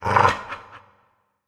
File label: Dog Sniff Prisoner; prison architect linux macos windows gamerip 2015